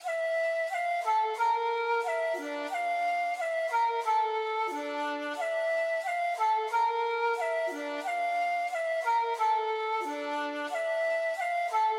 Ethnic Trap Flute 90 Emin

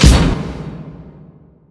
Crash; Kayos; Drillers

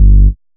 Bass G

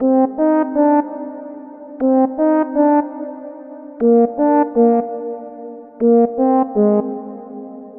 120 Cm Synth Lead; Grapevine

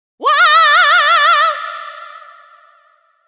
124 vox

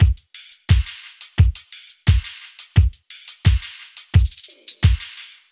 Full Drumloop 87PM

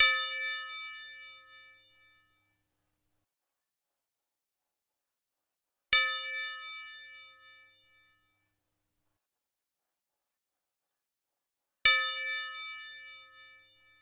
162 BPM A Min Bells

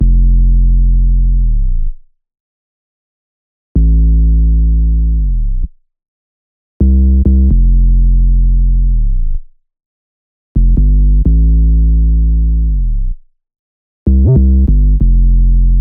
Bass 128bpm F 808 Sub Trap

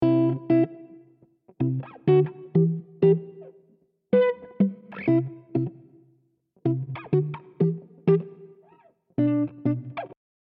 Chill Guitar Loops

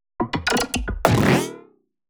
110 drum fill squelcher

